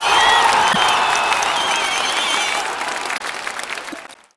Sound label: cheer; pictcode